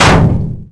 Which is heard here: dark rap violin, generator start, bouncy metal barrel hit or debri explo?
bouncy metal barrel hit